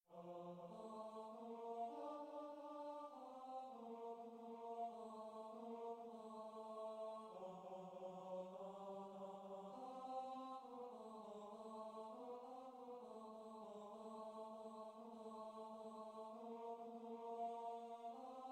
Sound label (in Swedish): herre mastare tenor; Herre Mästare kär tenor; Matteus; matteusmassan 2011